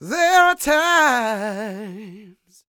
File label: SING, Vocal Planet Blues Soul BLUESY